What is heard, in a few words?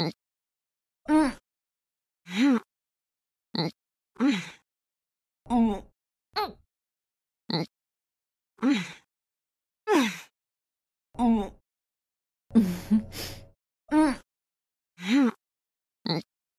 Grunt; Lewd SFX